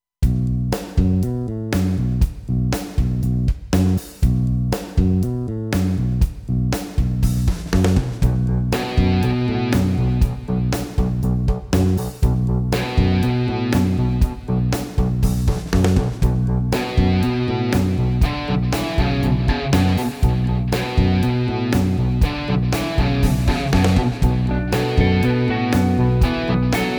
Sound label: Distant Thunder